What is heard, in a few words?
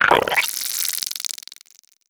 idle, headcrab black, creatures; vox